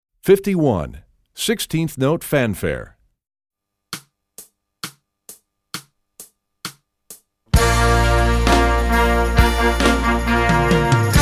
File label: Sixteenth Note Fanfare, trombone; essential elements